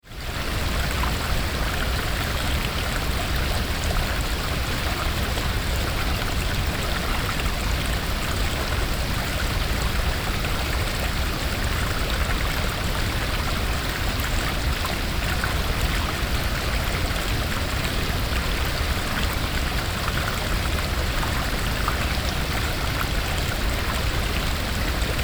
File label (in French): Berarde ruisseaux